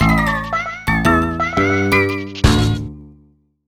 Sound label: Jingle Loser; Daredemo Asobi Taizen